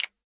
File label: Finger Snap; Western and Latin Percussion; single hits